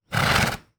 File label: Horse Snort